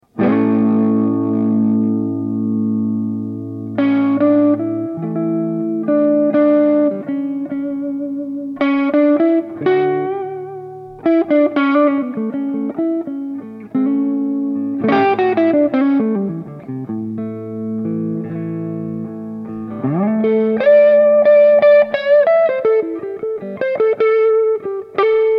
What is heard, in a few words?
medium gain jam; Twangophile